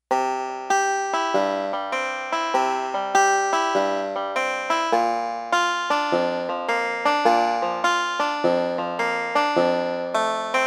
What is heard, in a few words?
Banjo